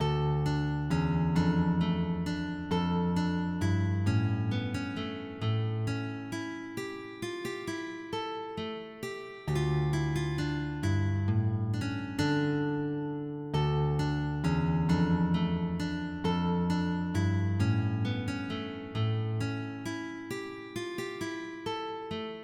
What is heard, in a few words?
Menuet